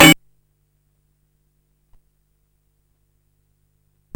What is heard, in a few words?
sword; Civilization